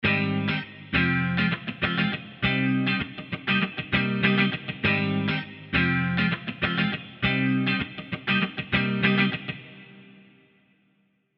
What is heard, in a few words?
Oxford clean Guitar